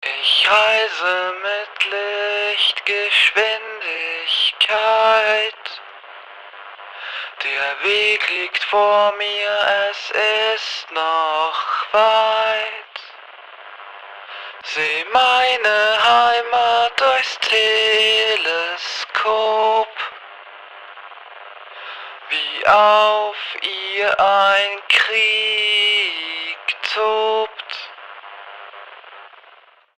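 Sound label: 130bpm vocal main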